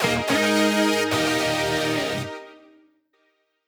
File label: Fanfare; Hero Egg; Timberman VS; ps4